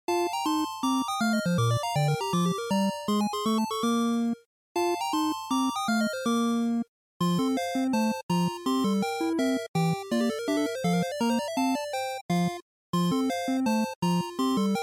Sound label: NES Sports